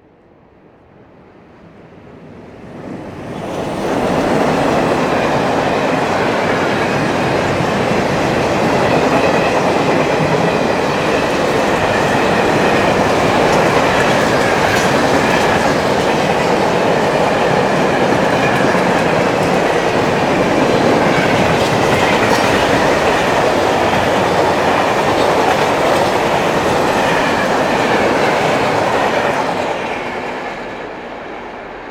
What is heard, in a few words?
train fast passing; best service Blue Box